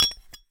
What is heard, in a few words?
Hole Saw Scrape; Hole Saw Bits